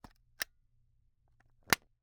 Camera, Kinusa K77, 50mm lens, plastic, film compartment, open close, creak, pop; Eiravaein Works; Vaeyan IV